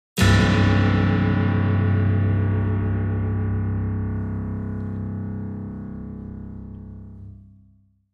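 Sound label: Grand Piano Strings Deep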